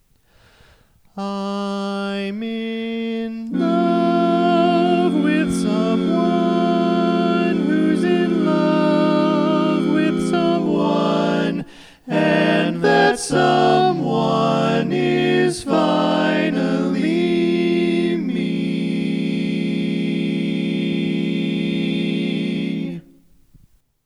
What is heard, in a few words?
All Parts, barbershop tags